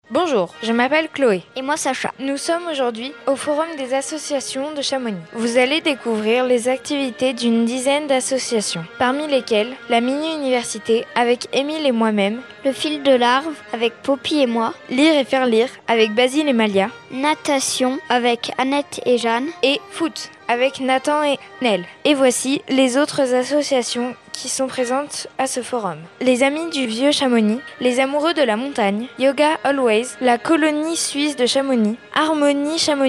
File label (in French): intro accordeon; Forum des associations; Globule Radio; Forum des associations lancement